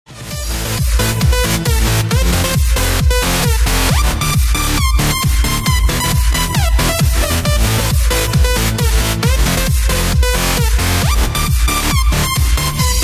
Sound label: electro